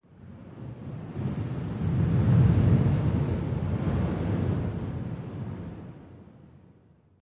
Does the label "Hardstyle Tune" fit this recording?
No